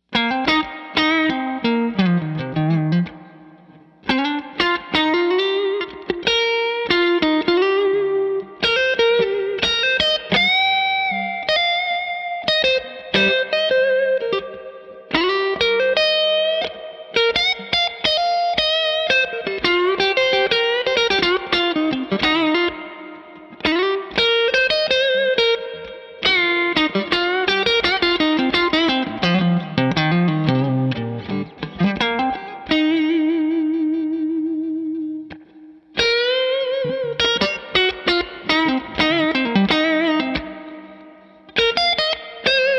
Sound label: Mesa Dual Rectifier Roadster Limpo